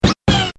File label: yoshi mount; mario